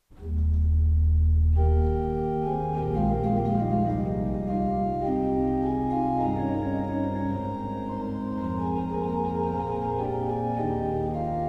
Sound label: organ